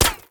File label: gun miss; Blind Drive